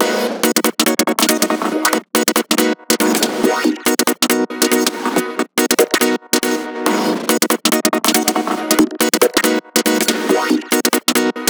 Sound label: 140 kit loop sharp synth chords fast rhythm variation G m